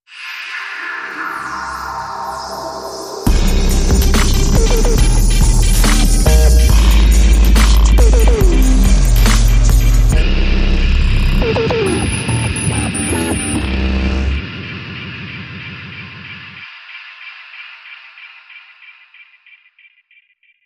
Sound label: Dubcore Sting